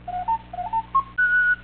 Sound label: fanfare; Leprechaun Demo